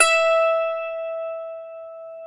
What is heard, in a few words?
lion harp E 2; SoundBytes; Early Patches